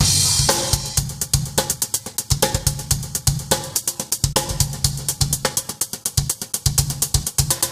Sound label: Old School Break, Drums